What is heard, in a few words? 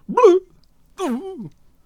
Healer Attack Vocals